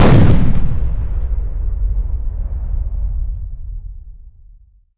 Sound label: canon fire; Panzer General 3D